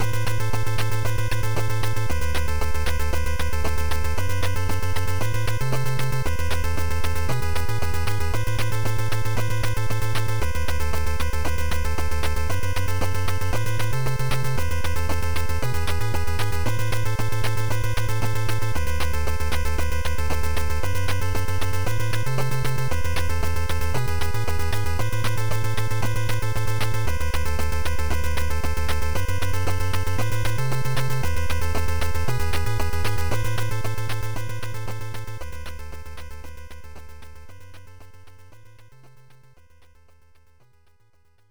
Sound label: Boss BGM; Robocop; NES